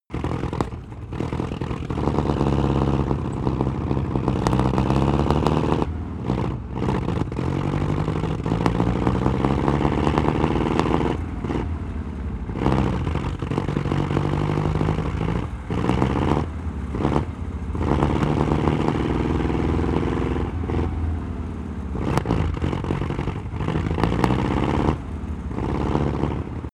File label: tank engine unsteady